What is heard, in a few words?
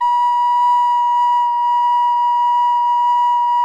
B5, kobliczek; SoundBytes - Early Patches